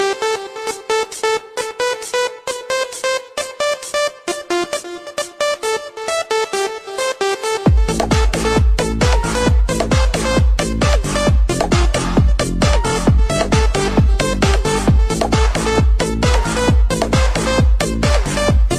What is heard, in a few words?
windows 7 remix